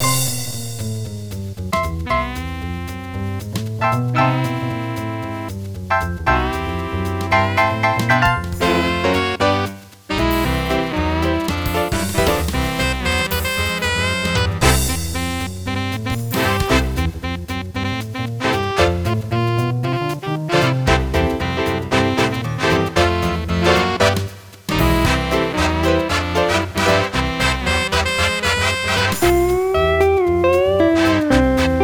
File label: Jazz